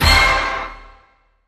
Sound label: orchestra hit